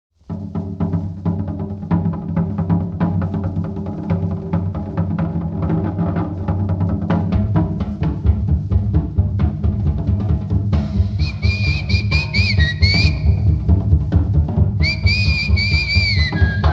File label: big noise